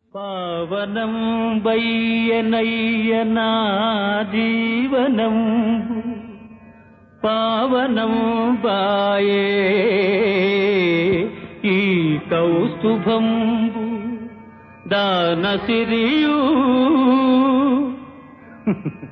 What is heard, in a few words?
Padyam; SriVenkateswaraMahatyam(1960)